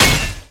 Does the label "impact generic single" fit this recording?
Yes